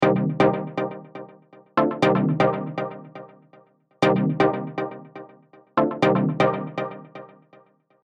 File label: key